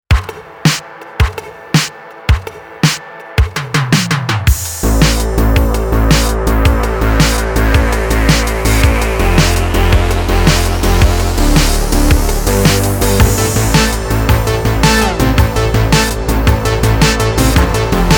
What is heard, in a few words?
JV Analog Dreams; JV Analog Dreams DEMO